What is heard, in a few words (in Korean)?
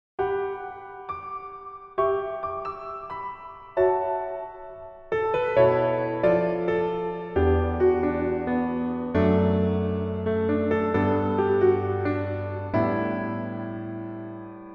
Piano MR 멜로디 MR -1 키